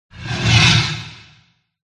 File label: dragon red attack voice middle